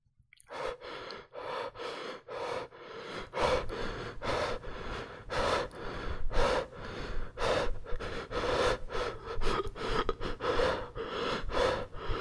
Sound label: Heavy Breathing Man Sound - Man Breath